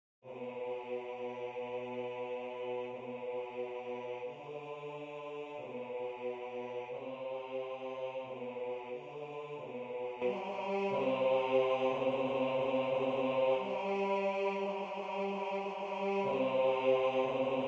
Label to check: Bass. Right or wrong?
Right